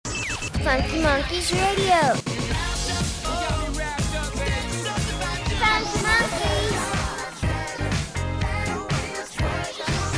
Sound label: Radio Music ID; Funky Monkeys Radio